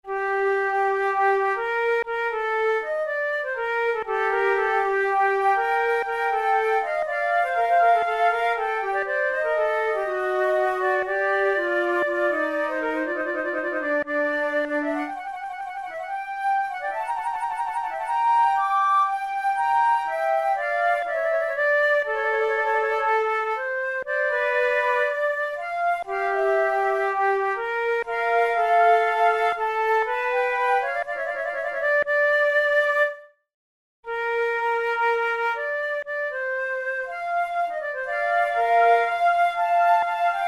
concerts for two flutes prelude; monteclair

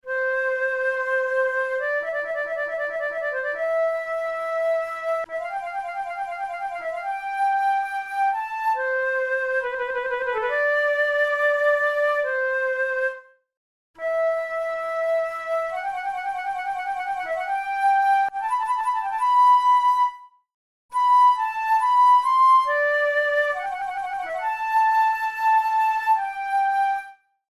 studies for the boehm flute; drouet 72

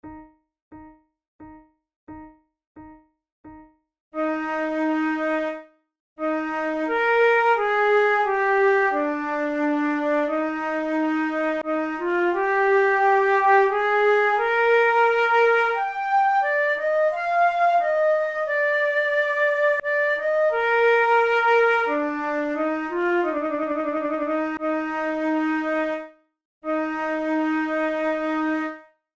prelude, flute

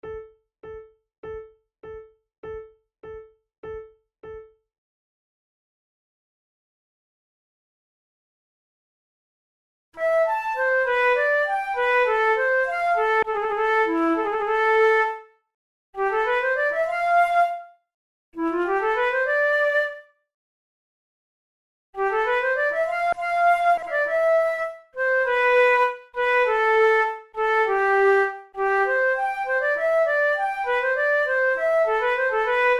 two flutes; Monteclair; 6 concerts; la picarde; flute tunes

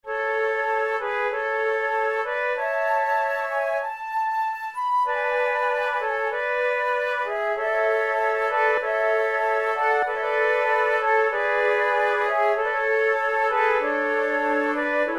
sonate en trio, lentement, flute

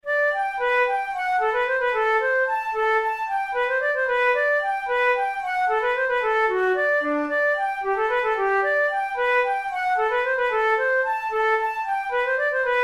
annan polka, flute

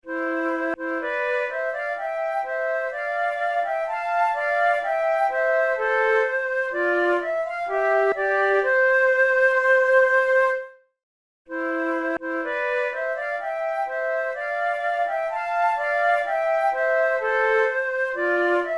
duet, flute tunes

